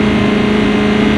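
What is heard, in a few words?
Engines low loop; SuperBike2001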